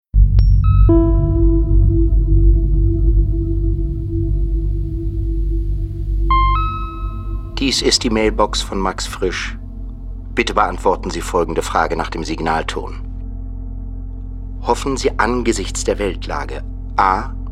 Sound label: mailbox